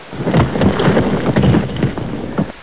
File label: rocks